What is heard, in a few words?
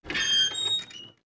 metal door open; FEAR